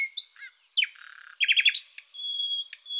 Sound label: Luscinia megarhynchos; slo birds